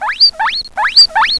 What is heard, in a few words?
cavy